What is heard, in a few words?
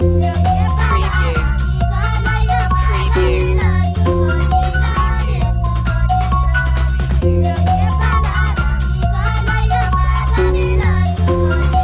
energetic playground loop